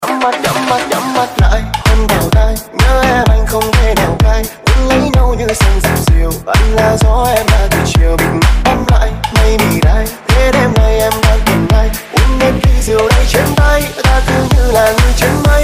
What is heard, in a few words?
Remix